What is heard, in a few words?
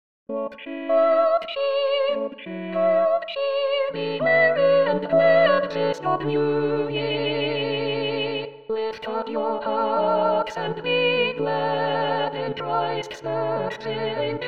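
soprano; Walton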